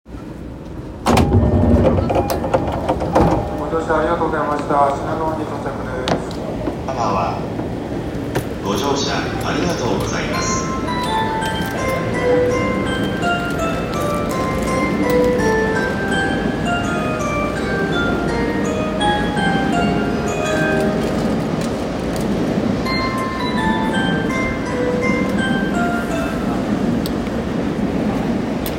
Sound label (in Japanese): 東海道線 品川 南, train sounds